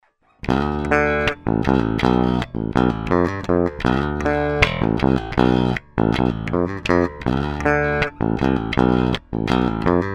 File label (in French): Tone Aigu, Stingray